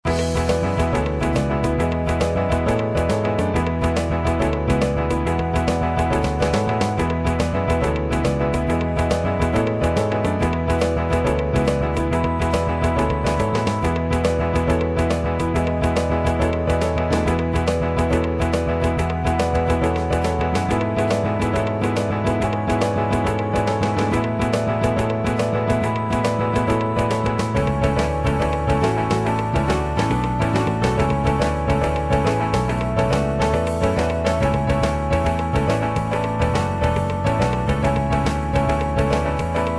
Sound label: Pirate; Survival Project